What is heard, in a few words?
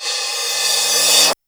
Rev-Cym; Roland R-70